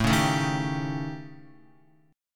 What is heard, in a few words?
A 7th Flat 5th, down, Guitar; A7b5 strummed; JGuitar